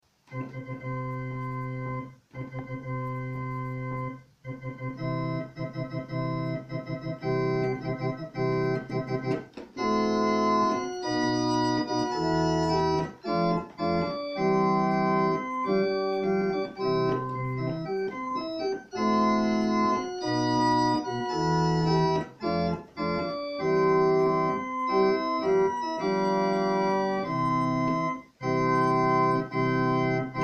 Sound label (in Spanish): marcha nupcial, organista; mendelson; bodas; palencia